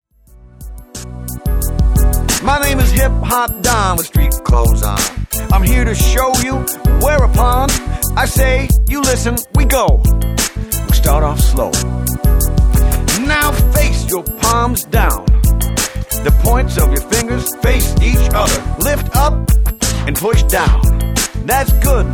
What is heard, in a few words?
hip hop slow